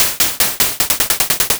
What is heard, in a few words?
beats